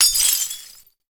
shattering drinking glass

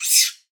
japanese monkey cry

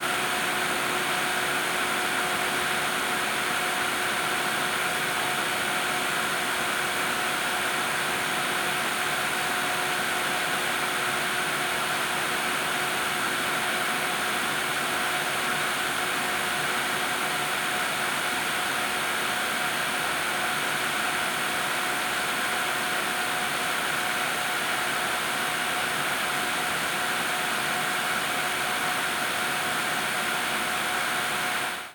computer working

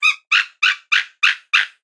white tailed eagle cry

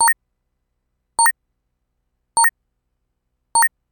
radar screen

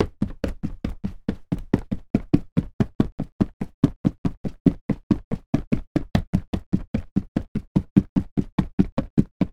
running on floorboards

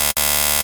wrong answer in quiz

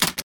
pushing button on old cash register

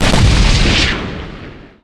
tank cannon

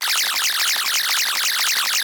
being flustered, anime

